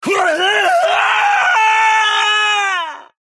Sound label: mirage desperation power, screams bloody murder; mirage screams bloody murder when he is low health